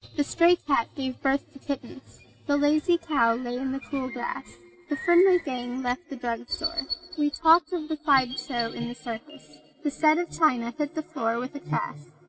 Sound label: birds